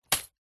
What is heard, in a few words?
industrial small plastic bag of metal screws drop or set down; zapsplat